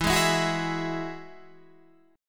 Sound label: E Major Add 9th, Guitar DADGAD